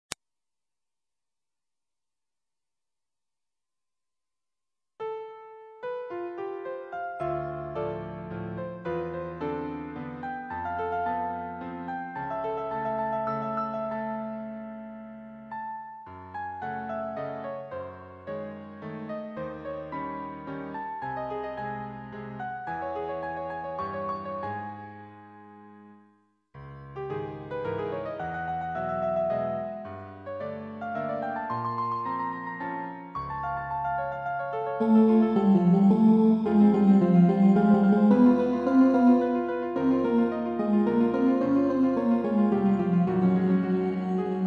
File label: Mazurka